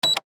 Cash Register, Industry, Cash, Register, Open, Drawer; BlastWave